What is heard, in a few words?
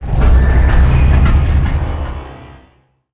widow stop; nod